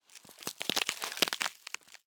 Cardboard, honeycomb, crush, collapse, short, twist; Eiravaein Works; Vaeyan IV